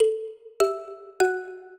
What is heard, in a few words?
minuet, mbira; Mozart